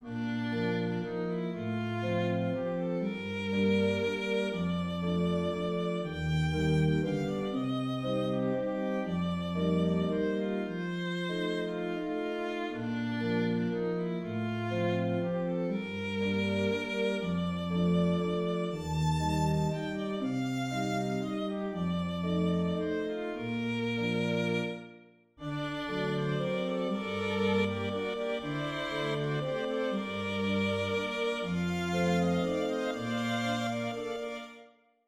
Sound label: Valse lente